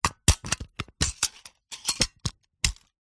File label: office stapler mechanism open close